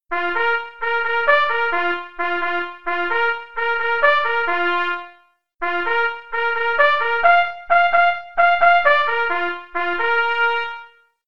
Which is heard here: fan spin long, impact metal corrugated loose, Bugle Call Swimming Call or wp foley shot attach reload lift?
Bugle Call Swimming Call